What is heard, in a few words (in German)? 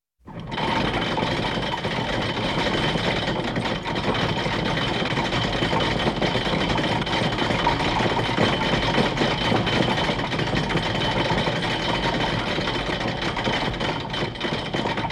einholen des schiffsankers; Hafen